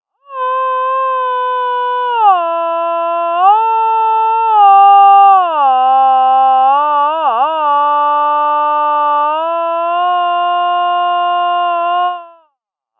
without vibrato

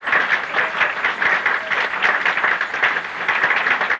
applause

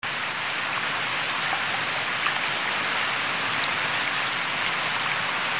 rain mid; ecrins; Mars

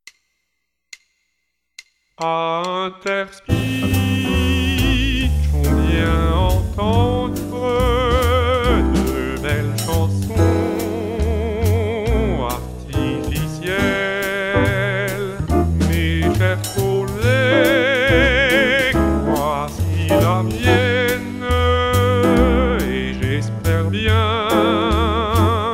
with music

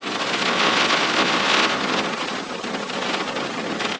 rain

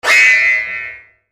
FX PIANO; ecrins; Mars

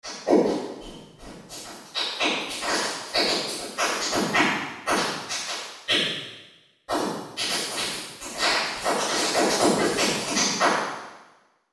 Small hall bright; .mp3 file - wet = dry - 26.7dB*; freeverb

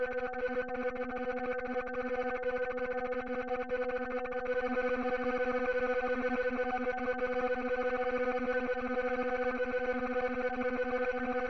Tremolo

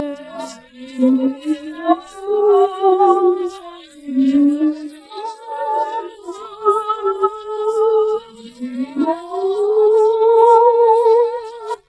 singing

